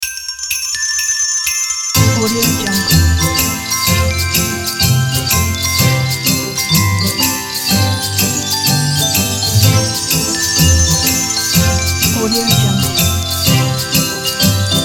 christmas swing logo

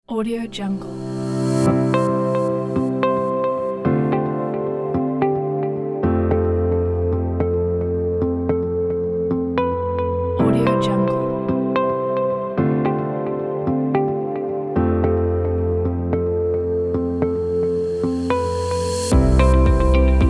ambient background corporate kit

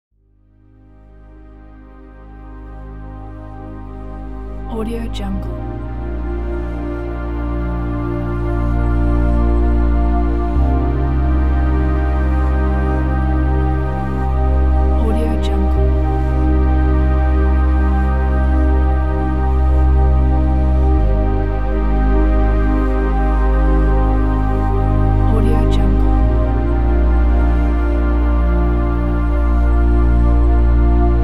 emotional ambient soundscape

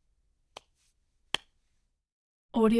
fist in palm

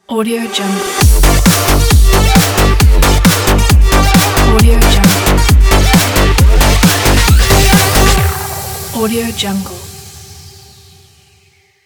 dance logo; boxbeats

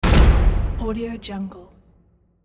big hall close door

